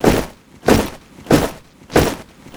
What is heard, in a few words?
infantry move animation; Hearts of Iron IV Death or Dishonor